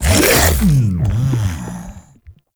Devour Impact C; Warframe; Grendel

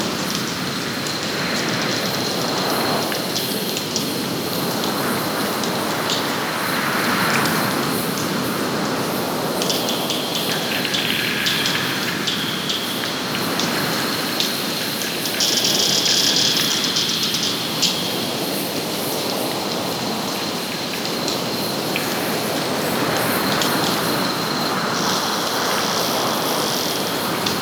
Forest Exterior Night Calm Amb; Warframe; Ambience; Grineer Forest

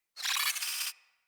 Space Drone Death; Corpus

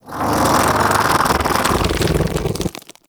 Bug Vocal Idle; Characters; Warframe; Hunting Critters; InfKDriveBug

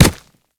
Kubrow Run Dirt; Warframe

